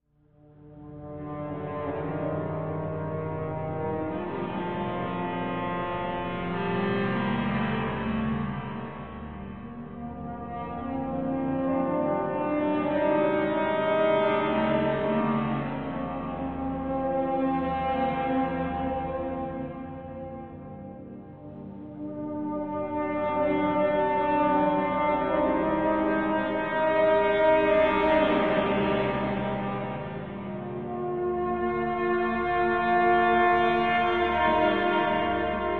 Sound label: Music Eerie Slow Sax, orchestral